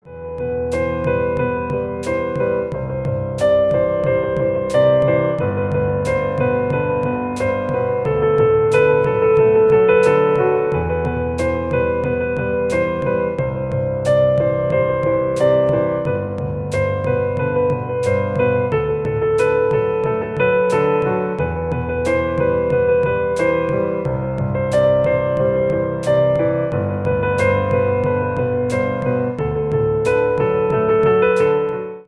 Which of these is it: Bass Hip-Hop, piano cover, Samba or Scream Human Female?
piano cover